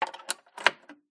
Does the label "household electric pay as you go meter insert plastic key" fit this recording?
Yes